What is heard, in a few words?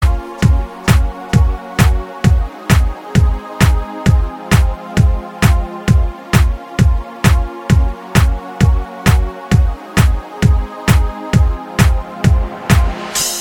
uzbek ringtone